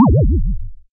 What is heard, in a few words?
game lose negative